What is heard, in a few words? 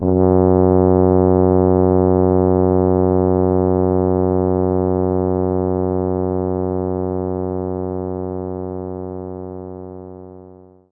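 Tuba F sharp 1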